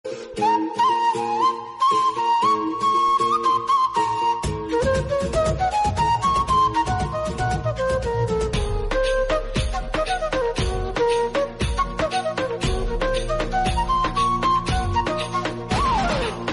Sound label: Instrumental